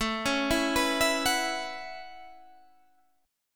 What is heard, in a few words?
A 6th Add 9th A down Guitar Standard; A6add9; arpeggiated